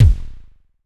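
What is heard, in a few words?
electronic bass drum; Best Service; Hyper Dance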